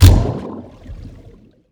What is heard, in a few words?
Spear Gun Shoot